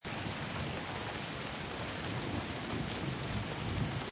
Rain With Very Distant Thunder Stereo Loop; Rain with very distant thunder. Seamlessly looping sound.

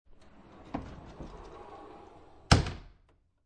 Door Close Sliding Bathroom

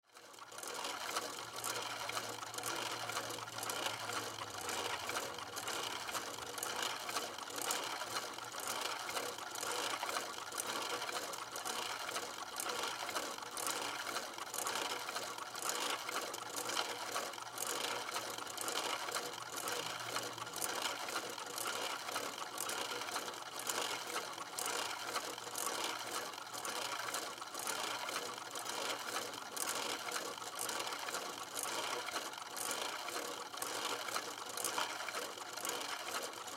Fireplace Fake, Fireplace Fake Motor Run; Fireplaces; Household

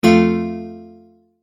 multimedia tone exclamation; McKinney Sound

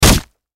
Impact Hit Juicy Wet Hard Splat; Punches and Hits; Combat; Blastwave

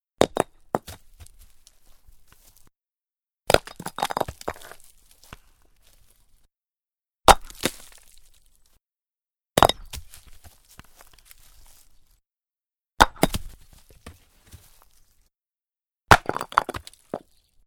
Rock Impact With Rocks And Tumble Settle; Stone impacts with pile of rocks and tumbles, settles; Rock Sound Effects

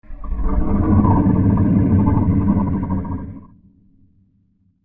Movement Underwater Shipwreck; Boat Sound Effects; fantasy sound effect

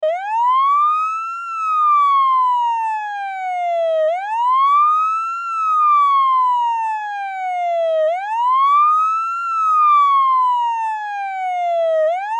Fire Truck Siren, Fire Truck Siren Slow; Emergency Vehicle

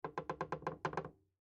Creak Wood Move; Foley; Creak Slow; Creaking; Objects; Blastwave

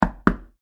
Foley Door Knock Solid Door Twice; Objects; SFX Bible

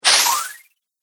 Magical Air

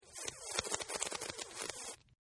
Slurp Female, Drinking; Sound Effects:Human; Partners In Rhyme

